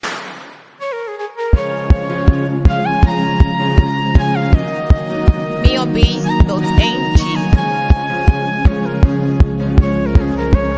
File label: Mara Over Everything